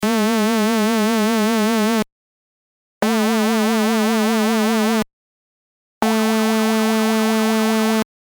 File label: Allpass Modulation